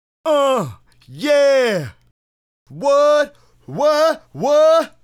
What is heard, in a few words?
Male Solo, Hip-Hop and RnB Vocals